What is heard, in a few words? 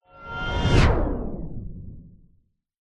sound design whoosh harmonic into slow motion; zapsplat